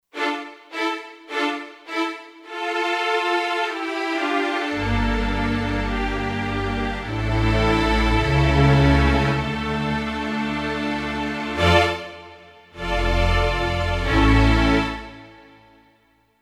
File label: Att ctl Med Str; Factory Programs